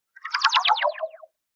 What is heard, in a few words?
Heli wash down